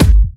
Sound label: Kicks; Vengeance Samples